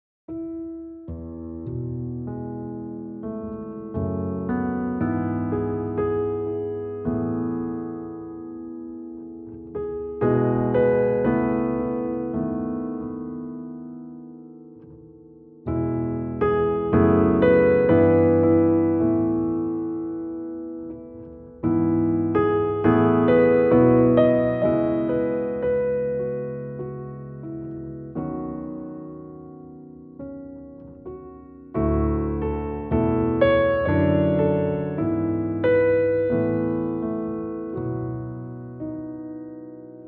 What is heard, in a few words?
rosewood grand